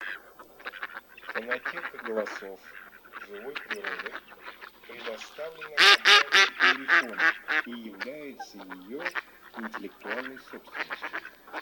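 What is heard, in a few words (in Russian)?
Кряква Germano, манок; plurifon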